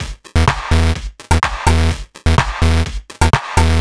No bass drum, Drum Loops 126bpm, Nu Dance Electro; Zero-G; Soundclash Nu Dance Electro; Acidized Wavs; Dubnobass

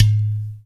UDU; NIGER.UDU; Spectrasonics; Heart of Africa

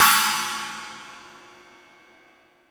Stadium Rox, Drum Machines, E-MU Pro-Cussion

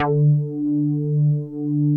JX MOOG D4, MOOGY BS; Drums In Your FACE!

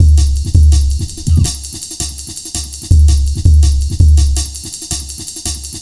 powerbase, Drum Bass Drumloops 165bpm; Zero-G; Total Drum Bass

